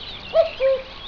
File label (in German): kuckuck